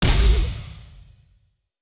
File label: Musical Square; SFX; FGUK